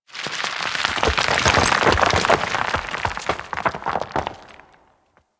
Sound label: Coring Rock Pour E; Warframe; Ambience; Grineer; Grn Intermediate Coring